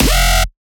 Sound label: Bass Shot F Scream, Dubstep; Ghosthack; Advent Calendar 2018